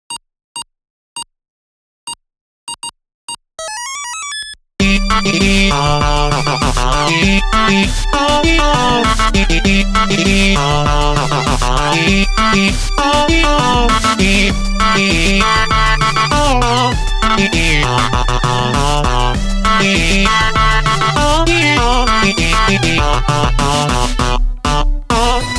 Parachute Modern

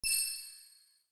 Pack Secret Key Count; Yu-Gi-Oh! Master Duel

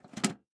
motherboard Slot

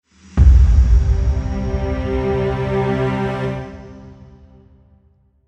End Dramatic; dust and salt

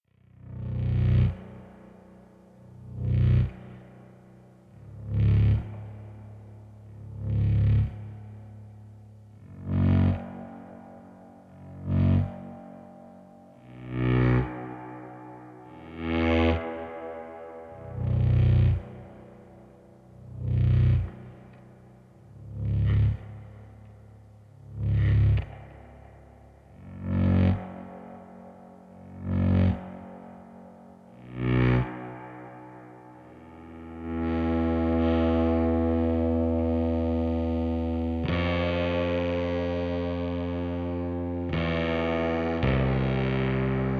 Electric Bass BG loop; The Club; The Detail ios linux macos windows gamerip 2014

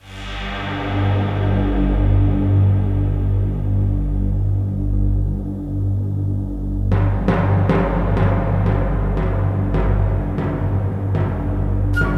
The Living Forest Ambient; Mortal Kombat Shaolin Monks; ps2 xbox 2005

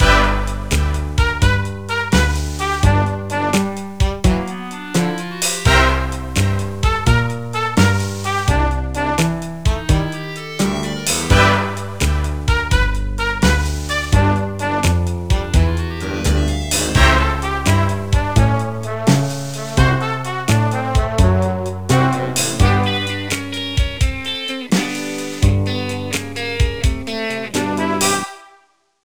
Mus Slow; Cahier de Vacances pour Adultes 2; NDS

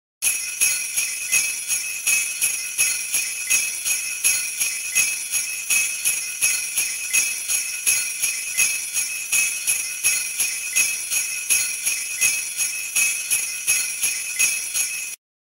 SFX Sleighbells Loop; Family Guy Back to the Multiverse

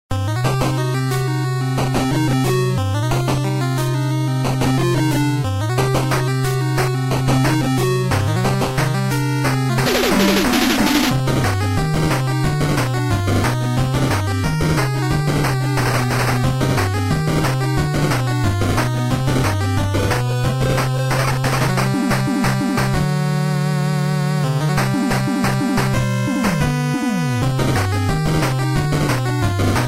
Robot Master Battle; Mega Man Rock Force; PC-FX

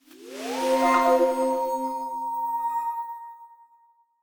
tracks unlock notif tape

